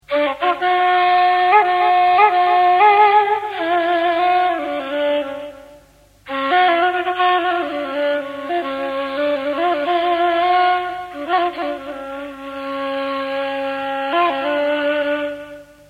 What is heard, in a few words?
ney